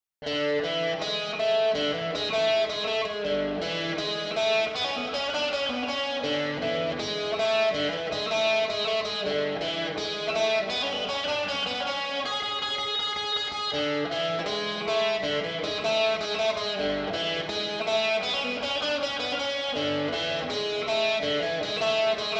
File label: guitar